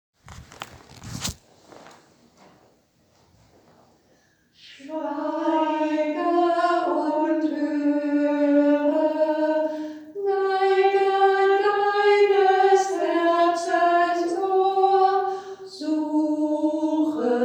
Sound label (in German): Schweige und Hoere Gesungen; versoehnungskirche